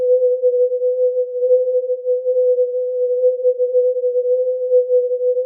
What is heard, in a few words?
nb noise